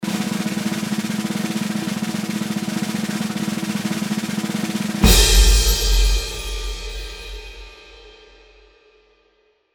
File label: drum Roll